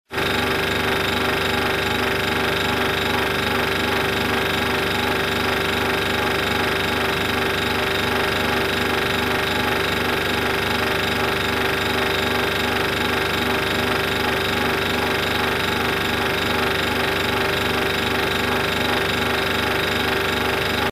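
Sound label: sound of refrigerator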